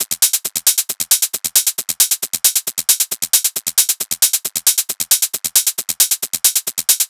Hat Loop 135, Techno; Ghosthack; Techno Sounds 2025

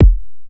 Kick, Afrohouse; Afro Drums; One Shots

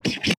Scratch; Cymatics; Humble Hip Hop Sample Pack

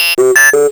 tone, Cartoon Film Effects

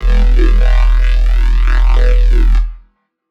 Bassline, Dubstep; GhostHack

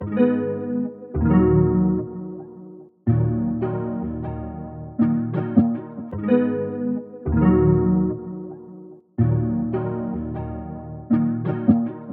157 BPM D# Min Guitar Chords, loop stems; Melody; Cymatics; 2022 Melody Collection - Various; Bellagio

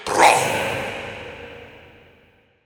Processed Battle Shouts, Viking Vocal

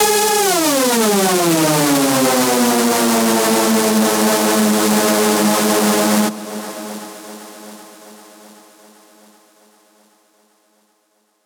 screech loop G# min 155; Music Loops; GhostHack

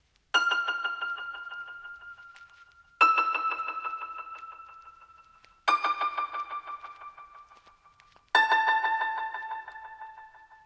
Classic Melody Loop, 90 BPM D Min Piano; Melodics; Loops; Loop Stems; Cymatics; Eternity

